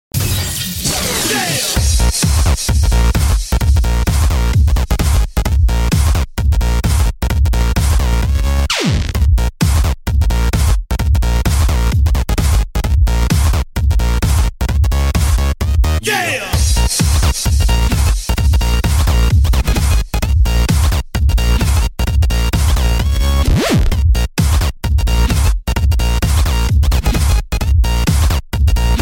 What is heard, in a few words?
Bass Yeah